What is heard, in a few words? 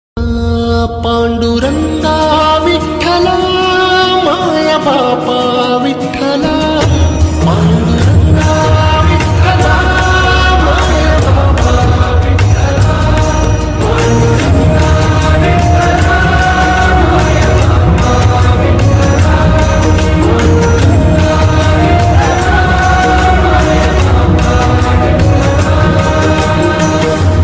marathi ringtone; panduranga vittala